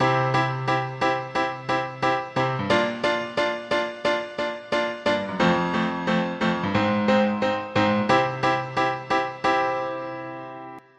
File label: major song